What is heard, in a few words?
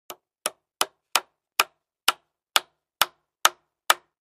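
2x4 Hammer Nail On Cement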